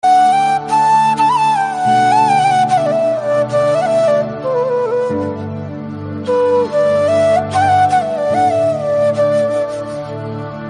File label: instrumental bgm